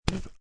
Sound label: Spit Low, Spitting/Horking, short, low-pitched; VoiceBox